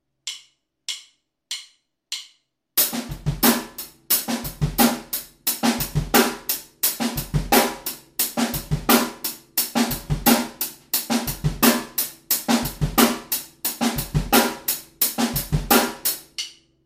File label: jungle ex